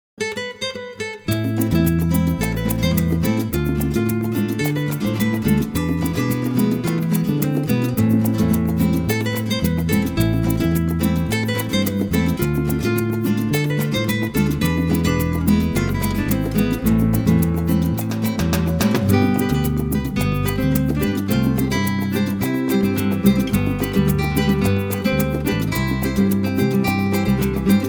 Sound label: Nuevo Flamenco